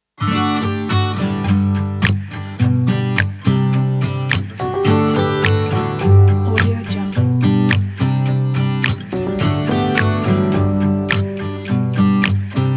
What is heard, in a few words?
positive thinking